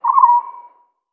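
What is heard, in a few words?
animal nocbird distant; Call of Duty Modern Warfare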